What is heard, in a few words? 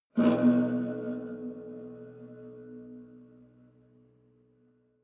low string buzz wobble